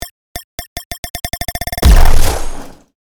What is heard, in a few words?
Sci fi plasma grenade futuristic time bomb explosion